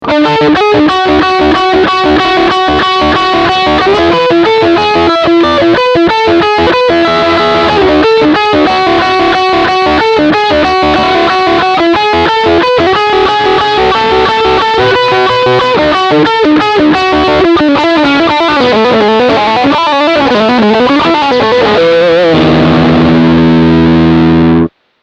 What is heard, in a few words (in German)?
Lick Steg Singlecoils